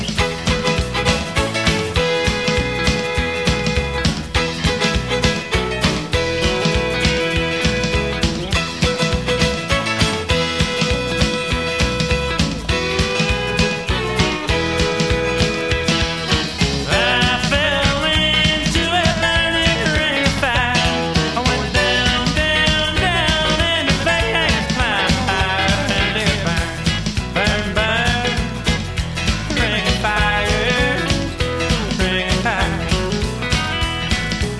song; zm dust

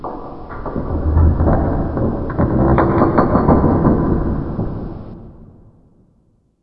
script metal; secret garden

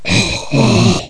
zombie alert; mystic radar; mr zombie1

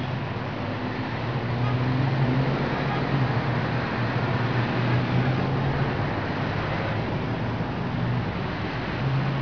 traffic

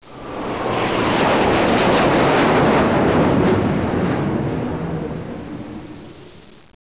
flyby

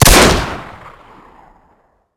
m1911 shoot, weapons; svencoop; ww2projekt

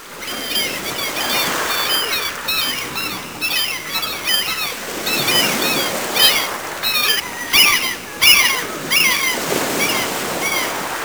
waves; colonial

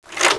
slide; cs reloaded

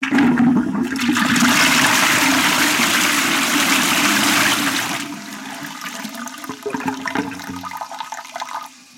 flush

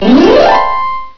piano; escher

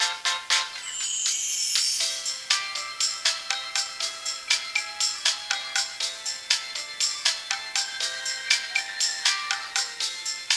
music elevator; de racetown

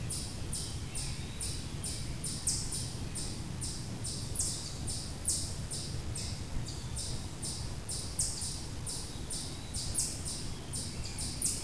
outdoor loop

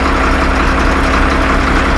dump idle

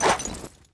knife swing, weapons; svencoop; ww2projekt

